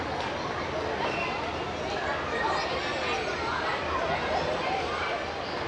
Children Playing Loop, Park

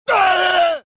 death; reborn

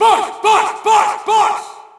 Shout, audience; UFC Tapout 2

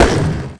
land wood; terrains; Tony Hawk's Project 8; XBOX

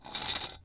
metal slide Concr sm; objects; Star Wars Obi-Wan; XBOX

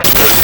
tv off; Phantom Crash

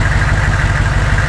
truck loop; Tony Hawk's Project 8; XBOX

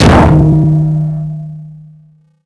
Sub Door Shut; Finding Nemo; XBOX

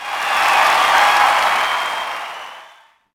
Cheer, Crowd, reactions

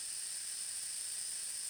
gas escaping; Soldier Of Fortune II Double Helix; XBOX; ambience

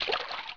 walk step water; skater; Tony Hawk's Project 8; XBOX

